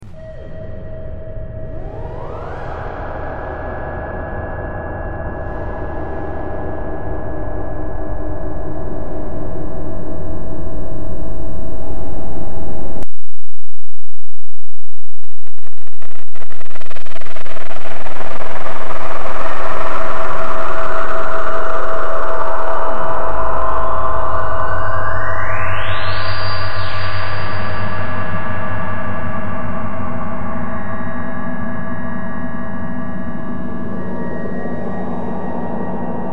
Ring Mod Brand Noise

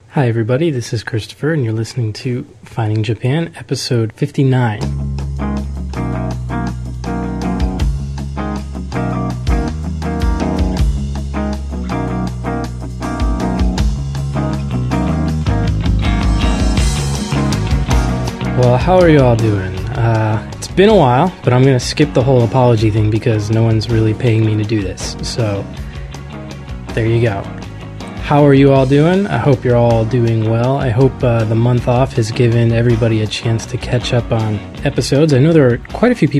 Saxophone Train; Finding Japan

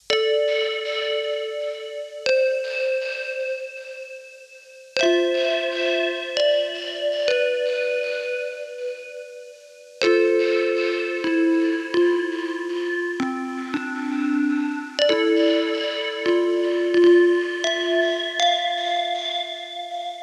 Jazz Band

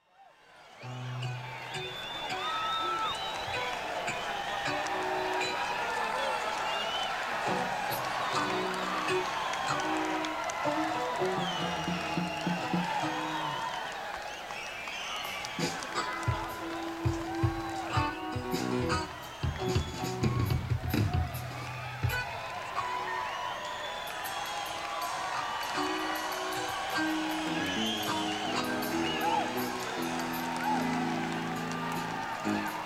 Crowd Tuning; senn me80

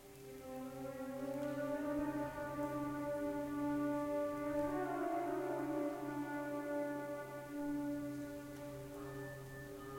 Flute; Cumbria Remixed Sample Pack

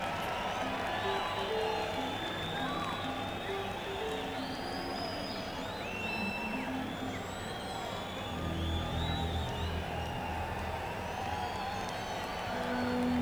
Crowd Tuning

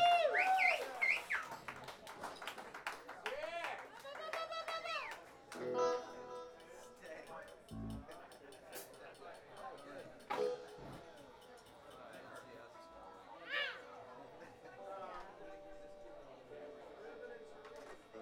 Fiddle Tuning

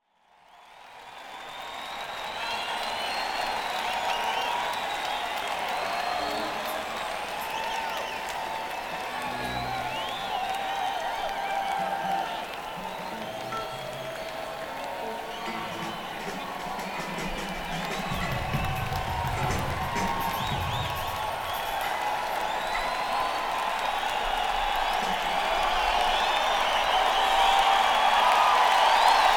Crowd; neumann km84i

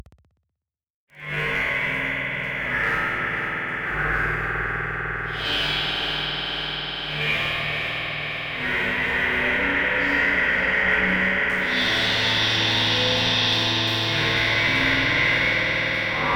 nebulosa delay

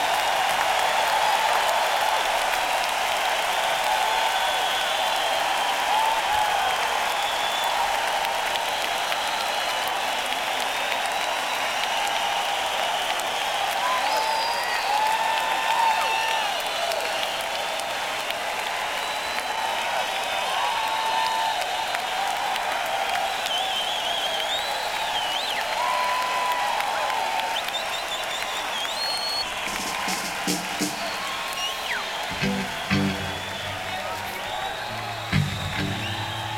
Encore Break

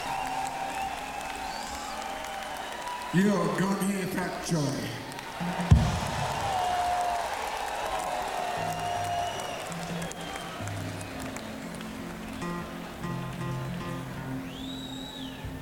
Crowd Tuning

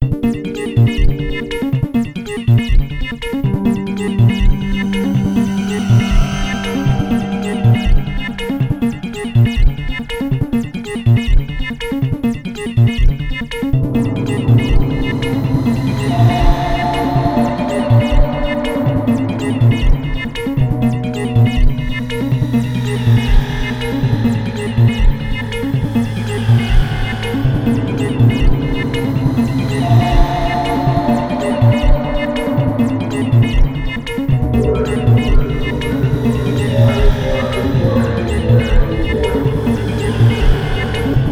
noise goop

